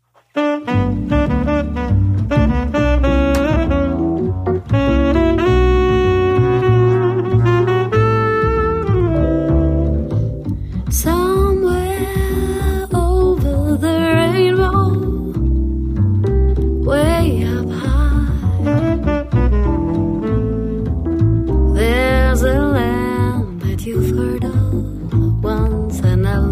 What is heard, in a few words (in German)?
Sax Quartett